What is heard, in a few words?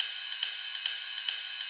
Loop 140 BPM; vengeance essential club sounds-3